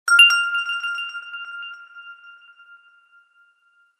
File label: alert